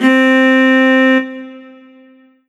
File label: Korg TR Rack Viola C4